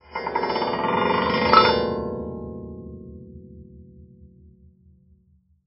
GLISS; Xsample VOL-1; 90 s Sample CDs

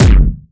DUTCH KICK; Masterbits; Soniq Elements

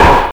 RIM, Drums Dance & Groove; USB Soundscan vol.11; AKAI

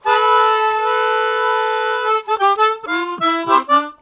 Min Octave, Harmonica; Zero G

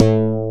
DIGITAL BASS, BassGuitar

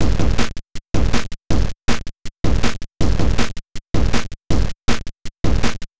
Noise Beat; Drums & Drones

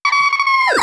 wowowow vox; vocal

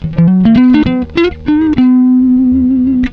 SOLO LICK, Gtr

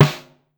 Snare, Pitched Down; Clavia DDrum-AT

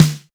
SD BONUS, Drums

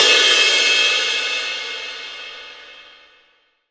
Crash Cymbal, 22INC KZIL MALLET

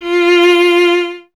STR VLA, Solo Instruments

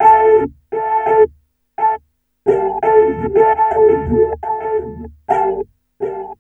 FX LP, 85, Hip Hop R n B; USB Soundscan vol.19

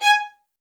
MED ATT G# 6, soft violins; Q Up Arts; Denny Jaeger Master Violin Library

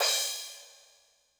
Korg 01W Dance Kit; 300 Drum Machines

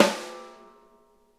SNARE RIM; Sonic Reality; Interactive Drum Kits

